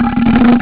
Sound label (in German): rohr verstopf; ST-XX Amiga Soundtracker Sample Packs